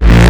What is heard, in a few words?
Offbeat Bass A#; Vengeance Samples